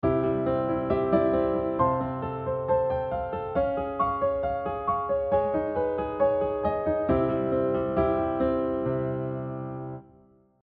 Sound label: chromatic mediant